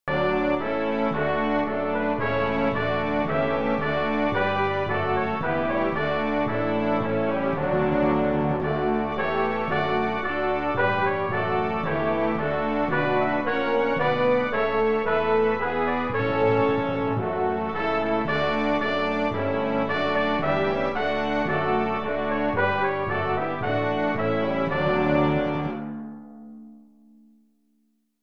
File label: Hymn brass; Hymn 58